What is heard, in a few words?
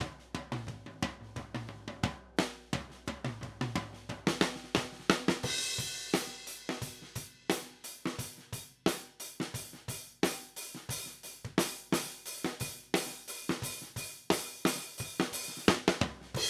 EQ OHs